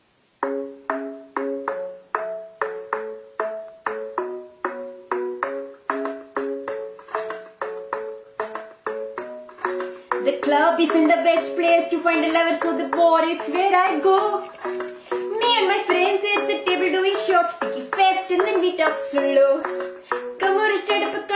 Indian Funny Female Version Ringtone

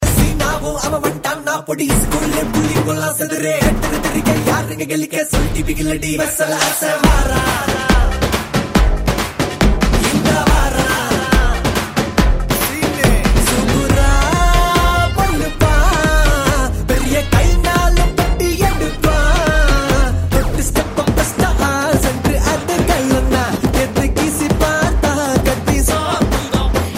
tamil Ringtone

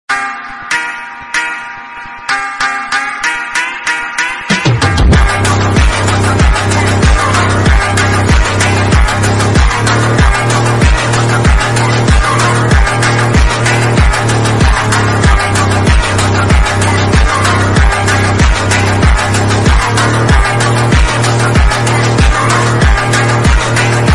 Slowed - Instrumental Ringtone

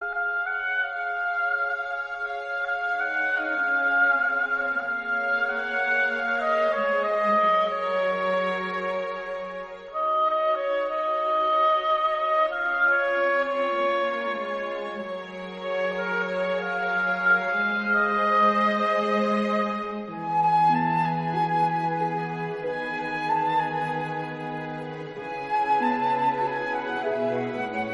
Sad Instrumental Ringtone; One Piece

